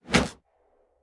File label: swing; odin; gugnir; smite